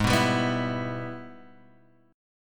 Ab Minor Major 7th Sharp 5th, down, Guitar, Open G